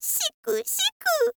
girl shikushiku

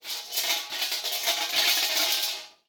shrine bell

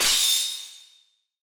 sword skill

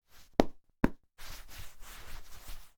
towel wipe hand

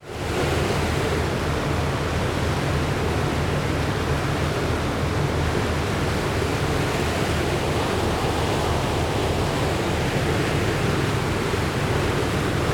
ship big underway

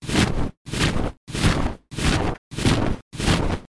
dracula wing

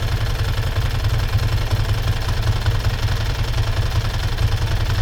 bike idling

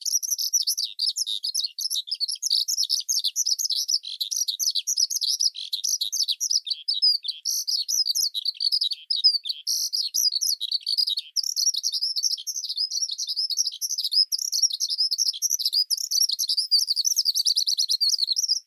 alauda arvensis cry

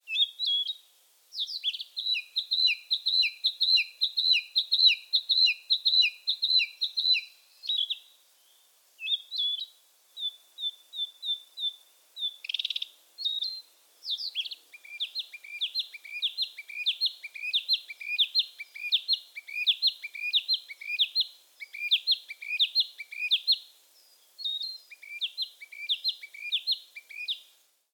ficedula narcissina twitter

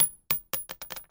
cartridge, battle

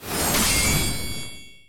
large sword slash

